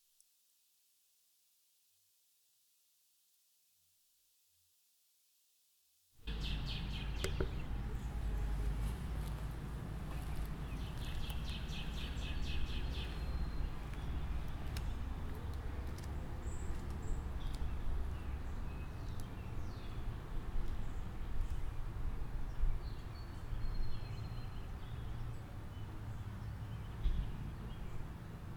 h4n internal mics w nr; hissing white noise

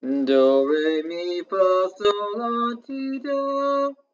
do re mi laptop; Saffire Pro; Lenovo ThinkPad W510

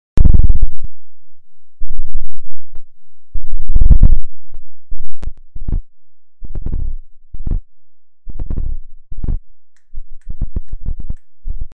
mic keeps doing this, thumping

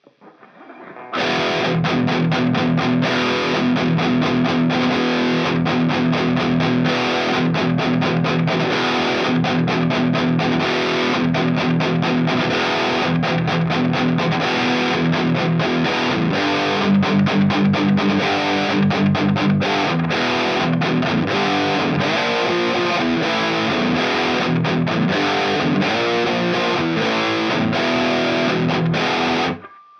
fx send to speaker impulse

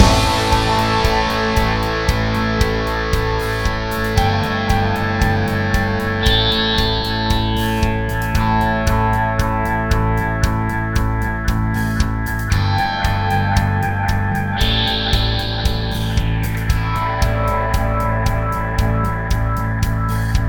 floyd grunge

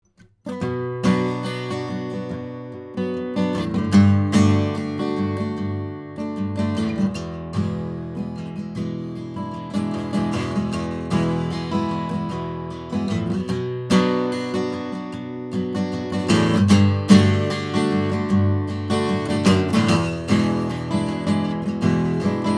acoustic; position2

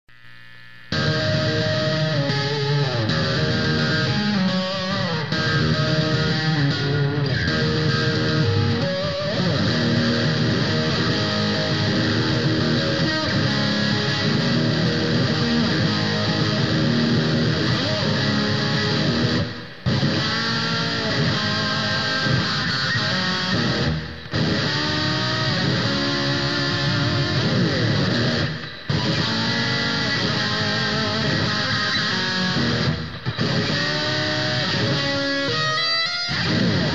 riffs; 2 sm57s

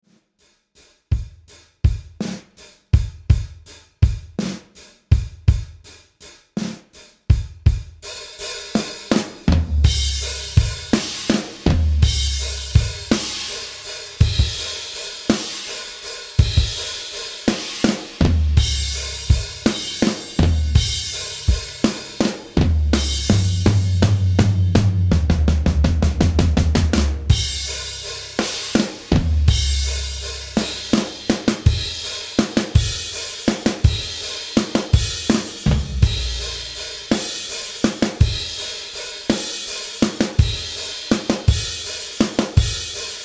drums iso

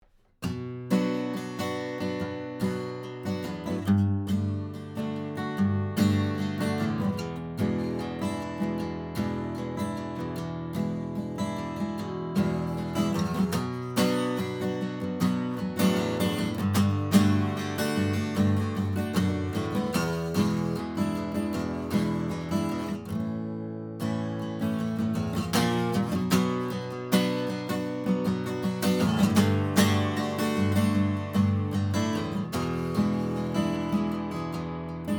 acoustic compressor

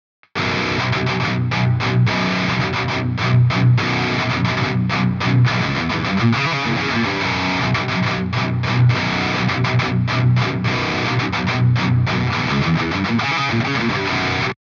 something metal just guitars

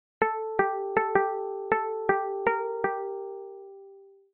piano; piano loop

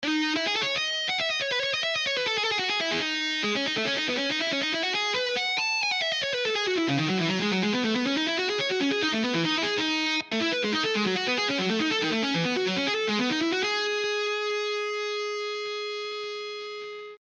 guest solo; clicks between notes

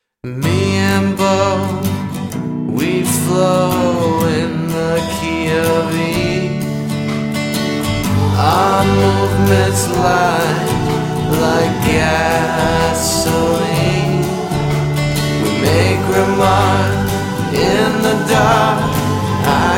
slim jazz club style; zippo commercial song

